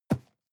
cardboard box medium grab hard; objekte

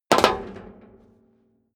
step high heels metal rattle jump; schritte

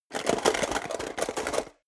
metal box small shake rattle short; soundpool

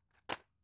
drug package drop deep soft; berufe; arzt

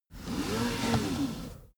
car seatbelt pull slow

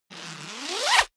zipper pullover jacket open accelerate; objekte; reissverschluss

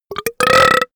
monster burp; comedy-cartoon; soundpool

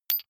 medium metal impact medium scrunch

